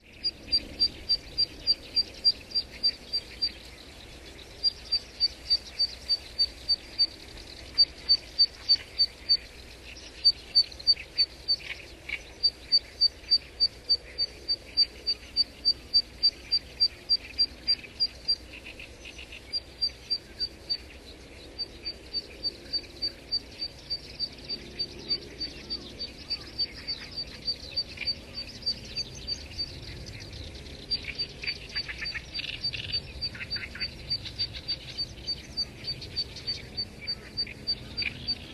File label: fan warbler